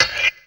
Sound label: FX Dance